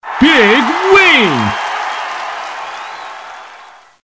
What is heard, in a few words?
X big win